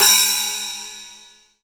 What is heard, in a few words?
crash cymbal, mono